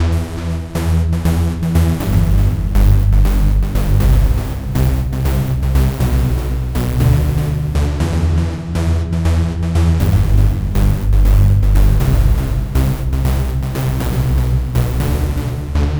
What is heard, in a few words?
Trance Synth